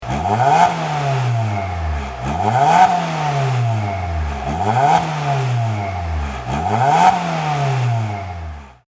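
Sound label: blip, muffler; 147 ts type2; center; unicorse-italial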